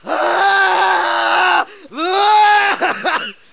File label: insane